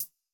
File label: hihat